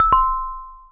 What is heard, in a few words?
Ring